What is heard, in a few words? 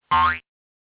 wrong; sponge and diploblast classification